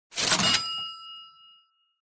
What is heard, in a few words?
sale; sfgame